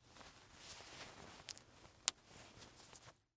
Short Sleeve Snap, Foley, Clothes, Short, Sleeve, Shirt, Pocket, Snap, Button; Dressing